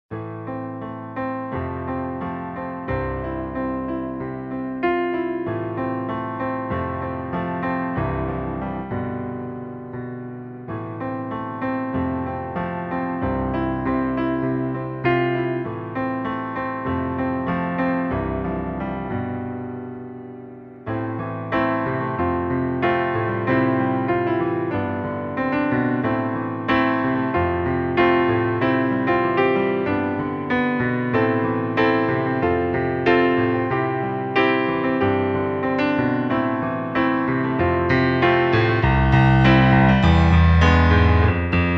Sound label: Piano Sketch